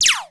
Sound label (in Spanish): lanzada